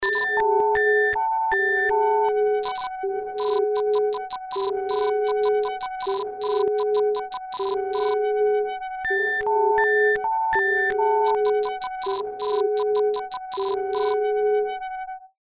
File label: SINE WAVE